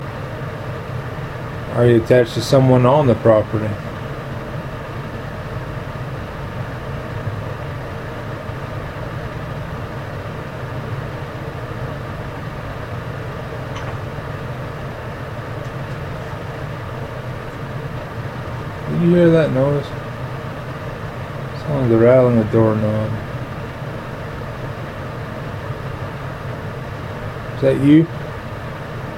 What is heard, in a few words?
Smith House Rattle